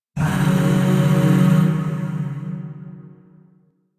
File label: Shawzin Yo Chord D; Music; Chords; Warframe; Zariman Void Shawzin; DE